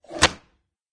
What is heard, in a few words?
lever off; doctorwho1200